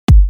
Kick 50Hz G; Vision

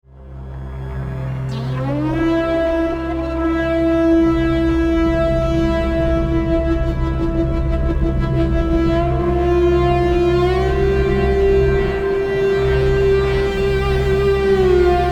textured waves

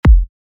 kick melodic F

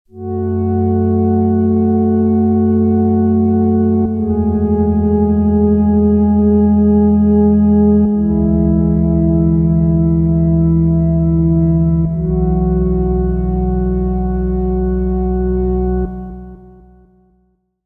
modulate Erosion; Basic Wavez

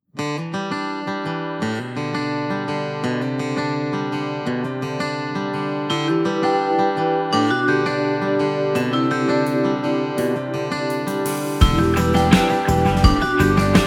Melodic Percussion Metal, Americana Trainbeat; Full Production

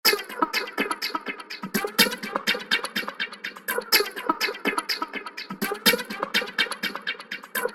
Minimal Loop Em 122; Basic Wavez Dulus

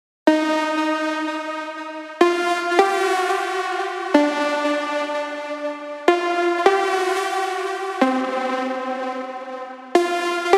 Serum LD Main

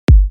Kick 50Hz G; Lost

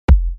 Kick 52Hz G; Kia

